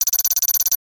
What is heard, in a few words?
technology data read out burst